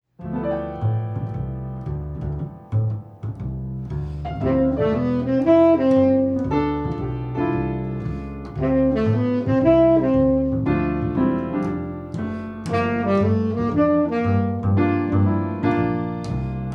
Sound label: Sax